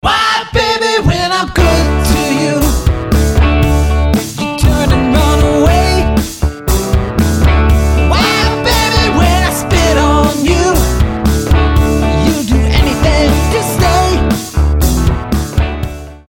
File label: Simple Guitar Rock Vocal